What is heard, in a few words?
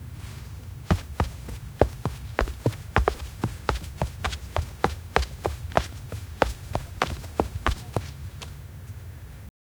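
a man wearing sandals walking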